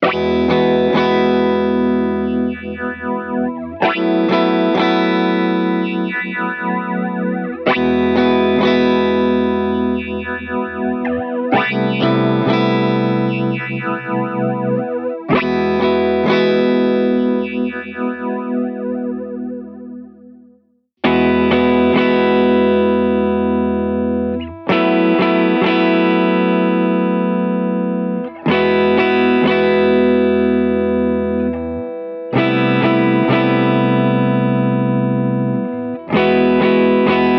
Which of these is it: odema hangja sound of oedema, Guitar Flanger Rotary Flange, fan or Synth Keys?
Guitar Flanger Rotary Flange